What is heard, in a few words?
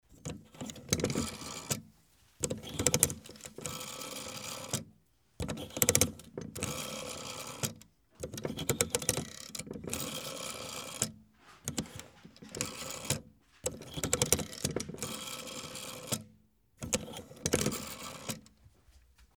old telephone